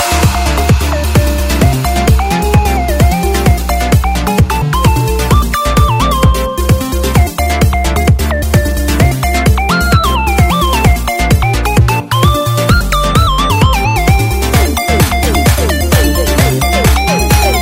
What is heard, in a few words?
ELECTRO